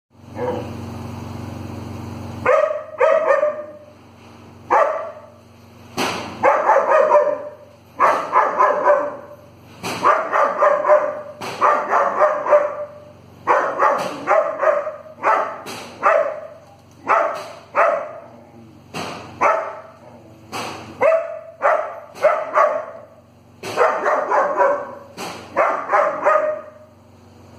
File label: German Shepherd